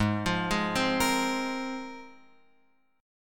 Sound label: Ab 6th Add 9th Ab, Guitar, Open F; arpeggiated; JGuitar